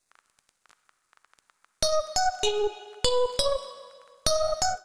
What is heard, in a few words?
Mallet Synth G# Minor 98 bpm; MIDI Clips; Tonal; Melodic; Ableton; Factory Packs; Chop and Swing